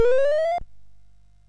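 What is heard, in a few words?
Petch, MOS 6581 SID chip music, games; hvsc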